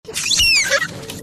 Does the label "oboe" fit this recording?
No